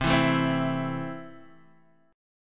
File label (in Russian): Akkord S6 boj